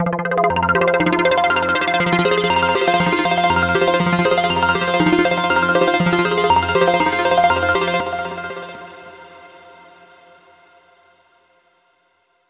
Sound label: Overlay Tone; Act2 Rezoning